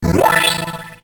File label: Coin; Raiden Fighters 2